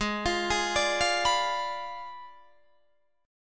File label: G sharp Diminished 7th G sharp, Guitar Drop D